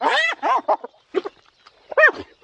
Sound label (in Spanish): hiena